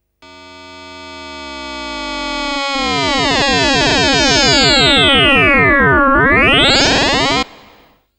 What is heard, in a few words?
FX, Drum & Bass